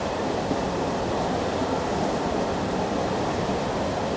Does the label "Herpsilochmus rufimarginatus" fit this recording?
No